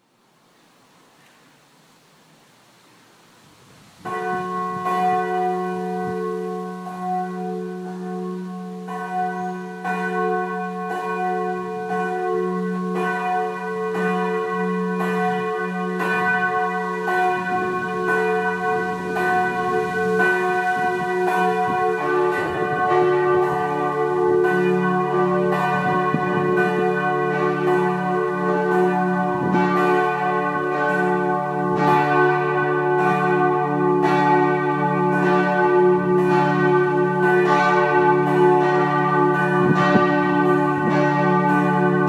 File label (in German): Weiperter Glocken